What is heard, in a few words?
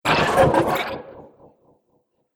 Science Fiction Laser Activate; Laser Sound; Sci-Fi Laser Sound Effect